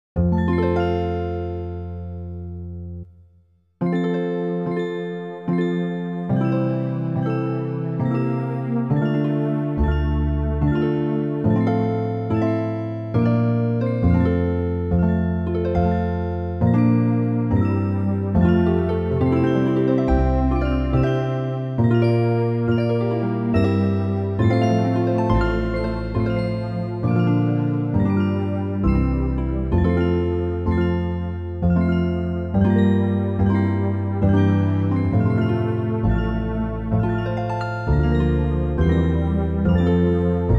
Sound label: karaoke high; ombra mai fu; handel